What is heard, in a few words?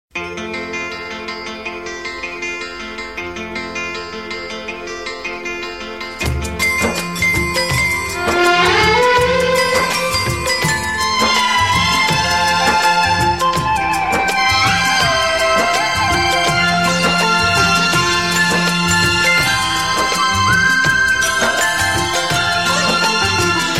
Soft Music